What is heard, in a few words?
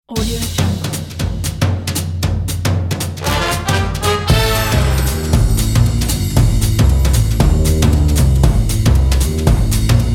big band